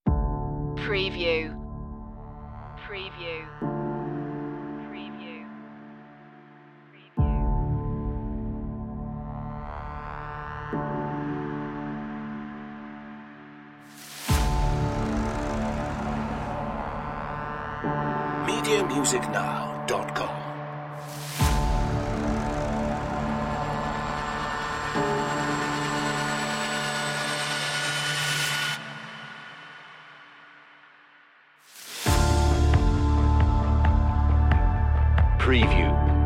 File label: outbreak no strings no choirs